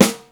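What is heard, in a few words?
snare picolo, Yamaha Motif ES drum machine; 300 Drum Machines; Yamaha Motif ES-Series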